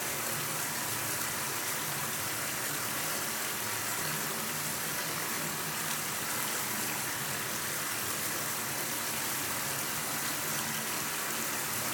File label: Fountain